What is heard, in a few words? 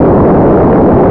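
wind loop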